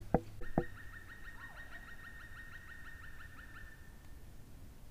oiseau

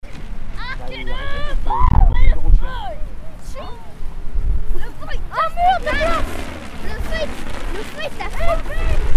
enfants cerfvolant

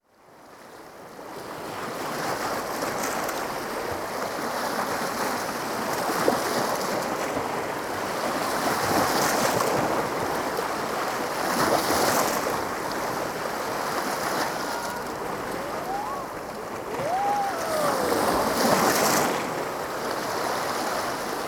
grado italie

